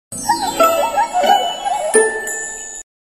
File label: Nice Elf Music Sound